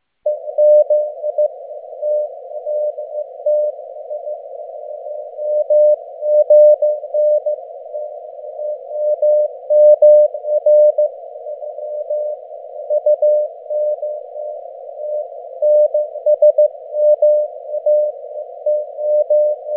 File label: fast fading 6db